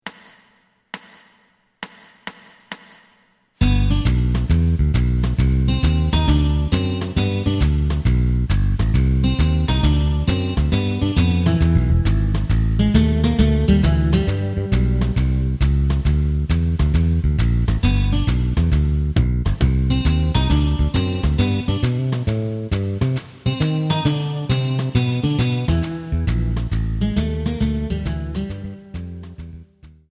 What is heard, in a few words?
lead